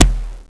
CHOP

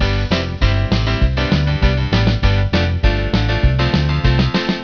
ROCK ROLL

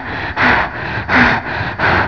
PANT FEM

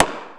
GUN, WEAPONS

